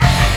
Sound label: hit, brass